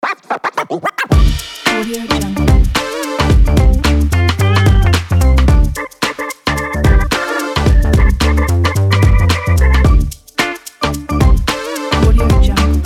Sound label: funky positive breaks